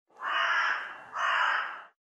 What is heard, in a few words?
raven; soundscapes